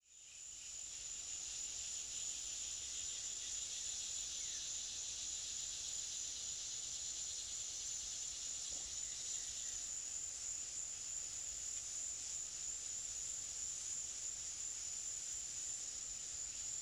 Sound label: Tropical Forest with Cicadadas